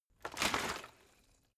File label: bottle water sparse upend; berufe